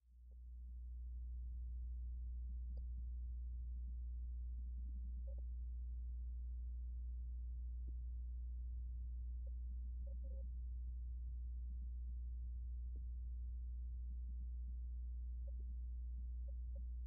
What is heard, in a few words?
man